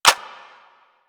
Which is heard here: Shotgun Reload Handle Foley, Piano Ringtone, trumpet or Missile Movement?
Shotgun Reload Handle Foley